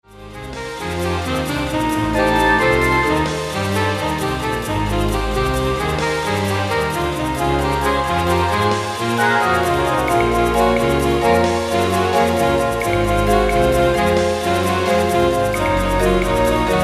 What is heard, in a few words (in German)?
Saxophon-Konzert